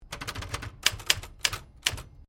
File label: Typewriter 1950s Sequence; Old typewriters; Typing; Offices